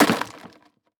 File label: Impact Plastic Drop On Rock Salt